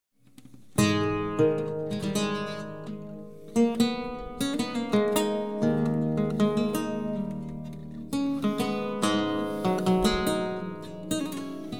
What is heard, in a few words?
Prelude